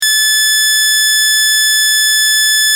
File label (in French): pulsation antenne relais TELEPHONIE MOBILE